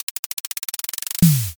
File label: drumroll